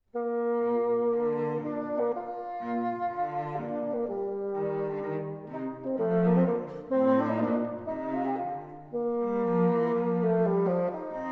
Sonata In B Flat For Bassoon and Violoncello, Allegro